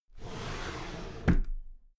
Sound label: drawer cabinet paper close regular